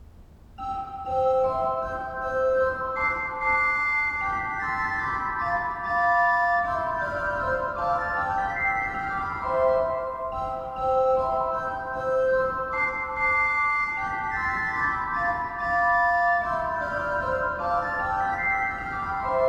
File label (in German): Rohrfloete 4, Kirche Thalwil Kuhnorgel